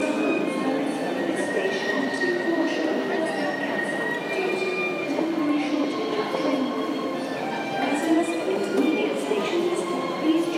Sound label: a brief burst of busking and station soundscape; from victoria on friday evening